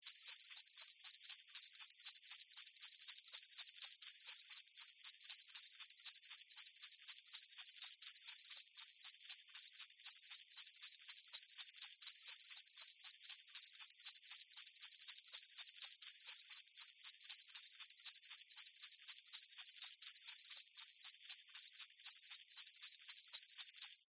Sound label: musical sleigh bells med shake